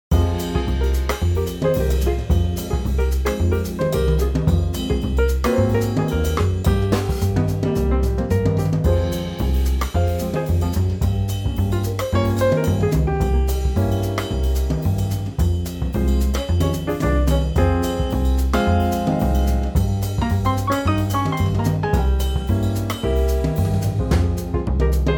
F Latin Trio

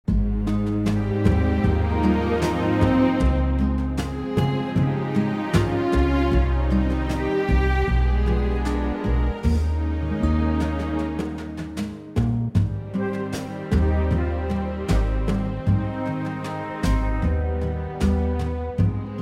Ab orchestral

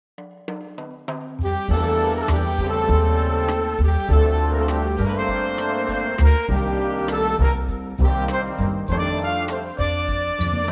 Big Band Bb, male

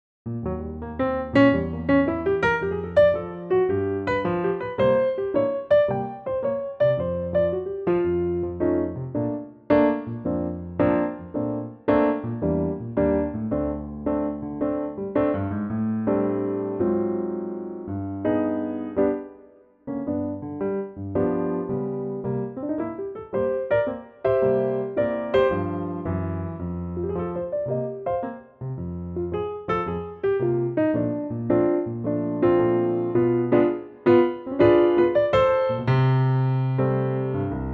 Bb piano, male

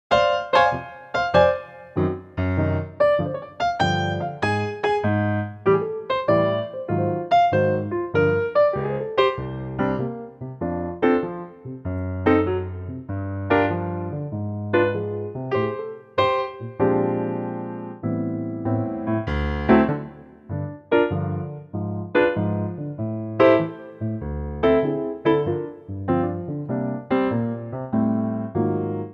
F Piano

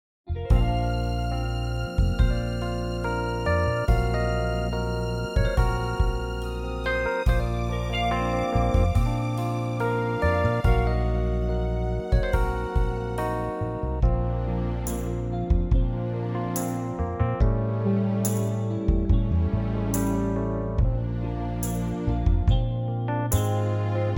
Bb orchestral

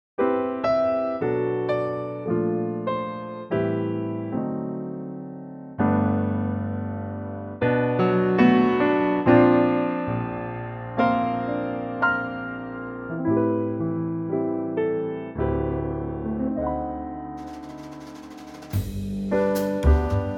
G Trio

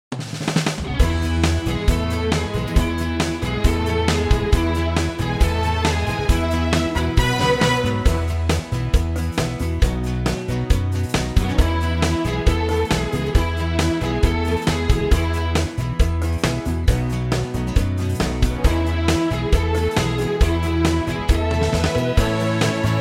E, female

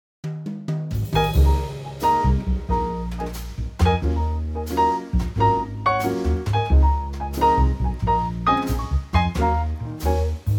Db Trio

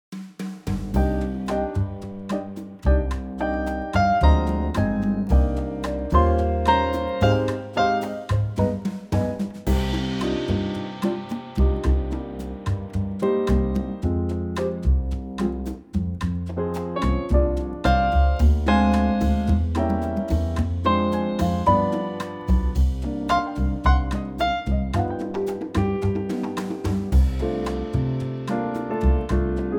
Cm Trio

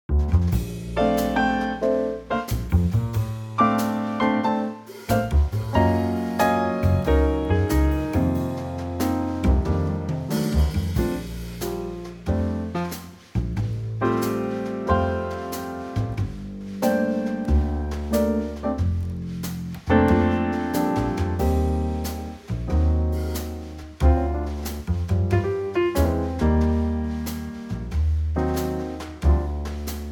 Bb Trio

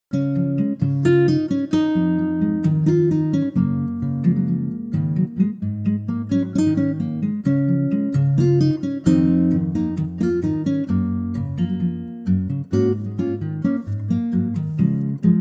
Db Ac Gtr, male; vocal range - Ab to Db; Superb acoustic guitar arrangement in a light Bossa feel

